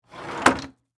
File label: Drawer Close Hotel, Foley, Drawer, Close, Fast, Hotel, Desk; Drawers (Sound Effects:Household)